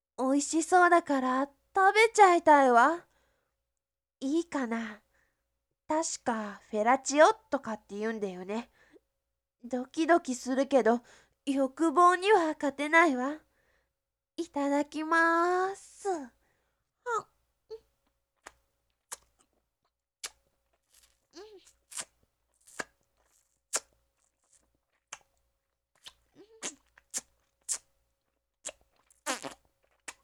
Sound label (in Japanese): ふぇら; Pick Up from Frozen Boy